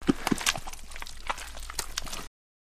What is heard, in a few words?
Blood From Mouth, gore